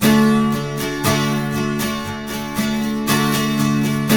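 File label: 12 string major D#, Guitars; Spectrasonics; Hans Zimmer Guitars VOL-1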